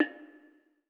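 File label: BONANG, Metalophones, Pitched Percussion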